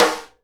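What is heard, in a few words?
SIDE STICK, Live Snares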